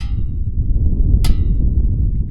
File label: Metal Hit